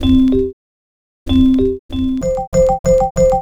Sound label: Xylophone; Sonic Foundry (Sony Creative Software); Stylus Pressure Urban Grooves on Digital Wax; Pleasant Ride